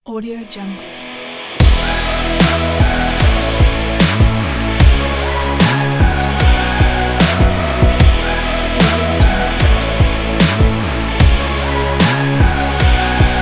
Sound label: fashion logo